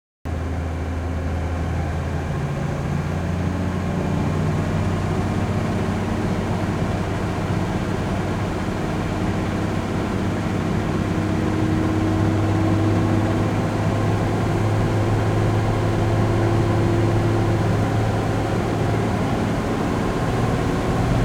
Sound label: Claas Dominator Combine ONBOARD Driving Sequence Short Constant RPMs Shutoff, Combine Harvester; AMBIANT; MKH8040; 88 SL Maxi; Dramatic Cat